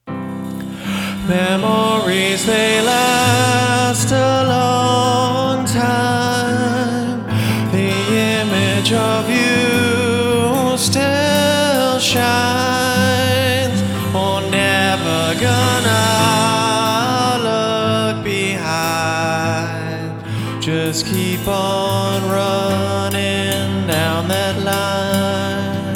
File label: Breath